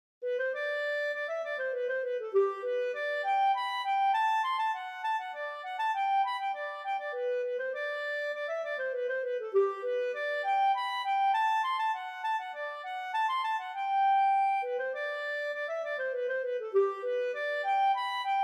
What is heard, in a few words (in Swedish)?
Polska; fran Laikarve